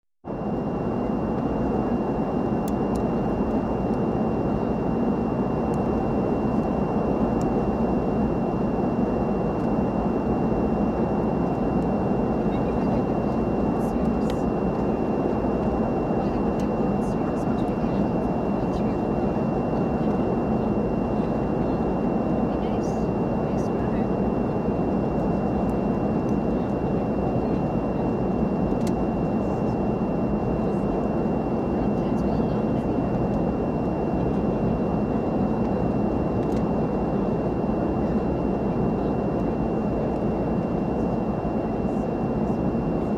Airplane in-flight cabin ambience

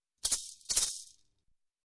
Shaker

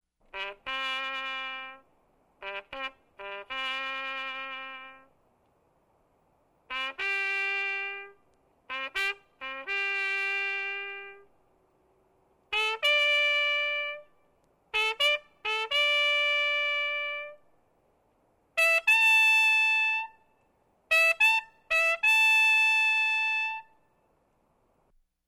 Trumpet Taps

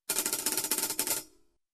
Hi Hat Roll

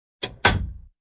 Steel Door close clink

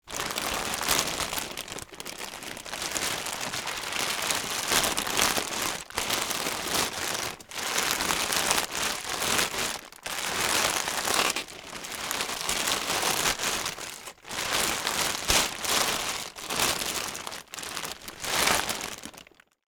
Going through plastic bag

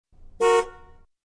Honking once in street

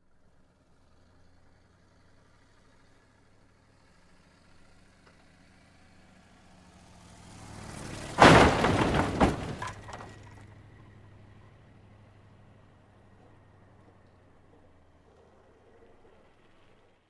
Approaching Car Crash

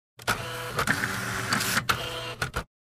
Printer Scanning

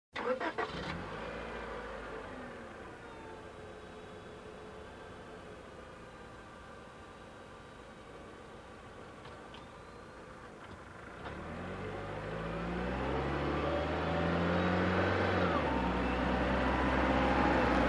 Car approaching and parking

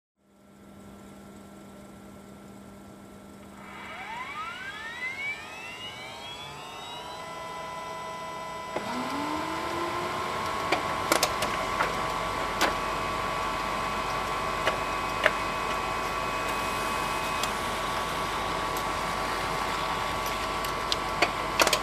Factory Machine Turn on

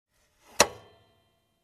Switch Click

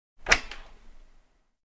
Compartment lock click sound

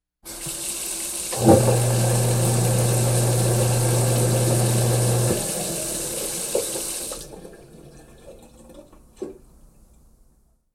Water splashing in sink

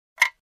Projector Edge Steel Clank

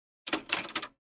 Aluminum Stamper

